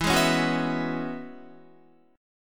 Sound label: E 6th Add 9th E down Guitar; E6add9 strummed